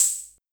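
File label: Hi-Hat Open, Luxor Passet; Drums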